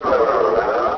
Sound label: alert; svencoop; cryocell